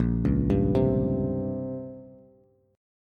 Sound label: Bb Minor 6th Add 9th Bb down Bass; arpeggiated; JGuitar